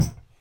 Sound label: Hit